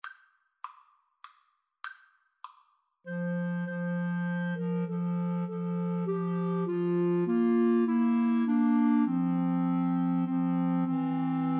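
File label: away in a manger 3 clarinet karaoke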